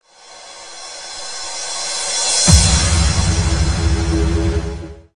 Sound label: Drum